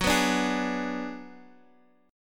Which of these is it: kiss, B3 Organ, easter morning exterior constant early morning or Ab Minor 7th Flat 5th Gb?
Ab Minor 7th Flat 5th Gb